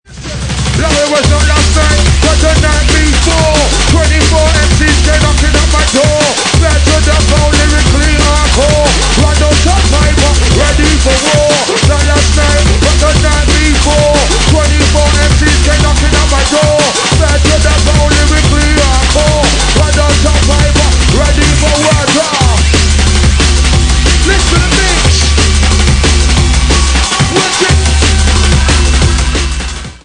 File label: DNB